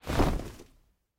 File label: library furniture shawl